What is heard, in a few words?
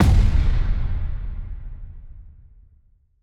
kaiju superweapon energy boom; biogenesis